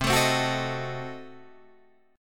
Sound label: C Diminished 7th down Guitar Drop D